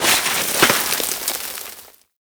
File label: Mountain Pass Snow Fall Ledge; Ambience; Warframe; The War Within